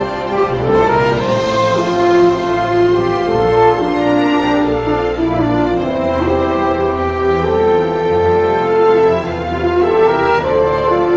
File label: French Horn; Frozen